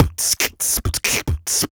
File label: Human Beat Box 140 Pop; Loopmasters